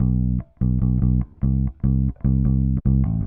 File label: Bass, Indie Rock; Sonic Foundry (Sony Creative Software); Indie Rock Anthems; Session 11 - Silos